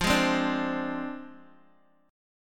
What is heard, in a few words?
F Minor 6th F down Guitar Open G